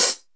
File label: short hi hat closed sound D key; hits one shots; hat samples